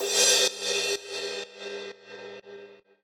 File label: Crash; Equipped Music; Loungin House